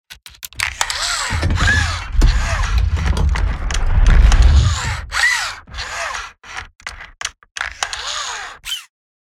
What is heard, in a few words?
ROBOT SMALL